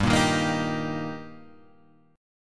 G Minor Sharp 5th G, Guitar, Standard; strummed; 3,1,1,3,4,3; JGuitar

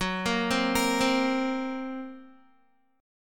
F sharp Major Flat 5th F sharp Guitar; F#Mb5 arpeggiated

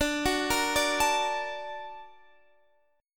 A sharp Augmented Major 7th

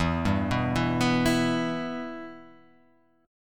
E-Minor; 12,10,9,9,11,11,x,x

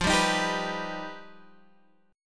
G Augmented 7th

